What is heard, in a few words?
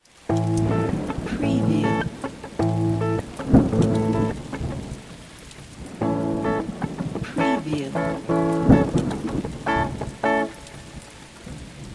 RTM Bastaki Am 105BPM, electric guitar; 6-8; beatcraftpro